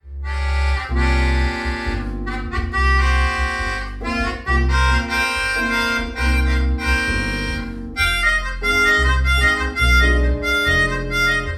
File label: Chorus